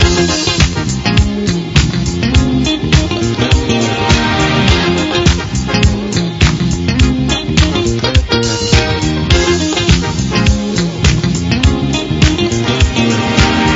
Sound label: random loop